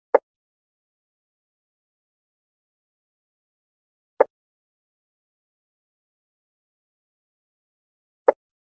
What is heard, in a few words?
limnodynastes peronii brown striped frog or striped marsh frog